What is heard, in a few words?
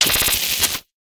defect digital glitch; sonniss gdc